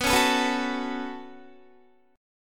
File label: B 7th Suspended 2nd B down Guitar; B7sus2; strummed